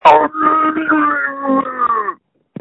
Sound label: Scream